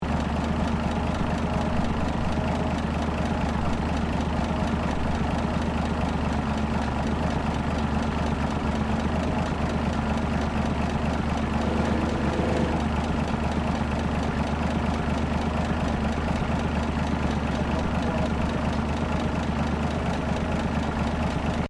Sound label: Car Holden FJ 1955 idle bonet open loop, classic Australian cars; Vehicles